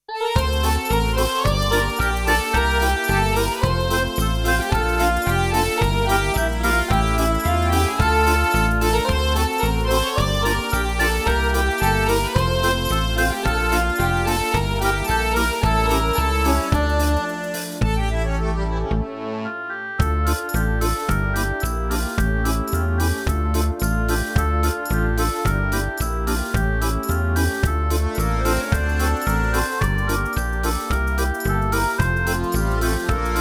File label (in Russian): buyan minus